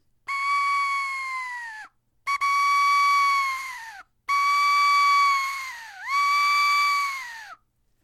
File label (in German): eule klein